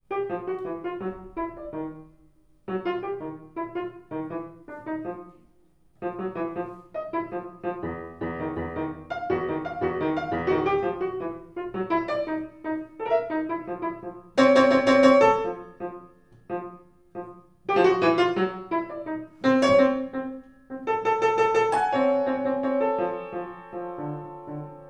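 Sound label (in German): Klavierstück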